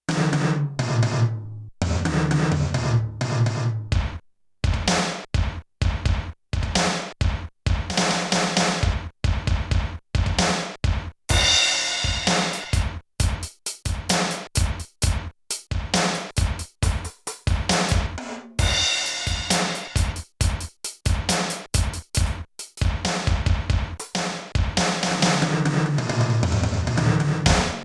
Drum Set; Rock Performance 2

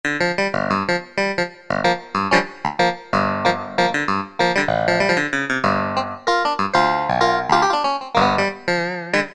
Clav; Piano - Keyboards

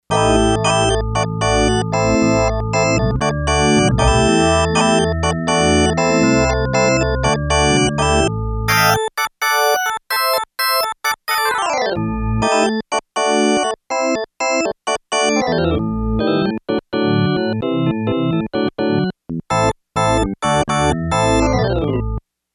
Brite Organ, Yamaha TX802; Factory Patches; Voices

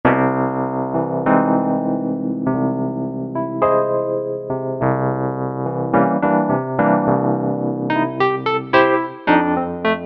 Vintage EP, E. Piano; Factory Voices

